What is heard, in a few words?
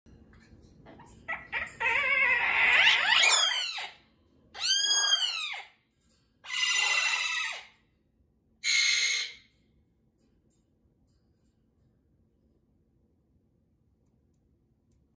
amazon parrot; طوطی آمازون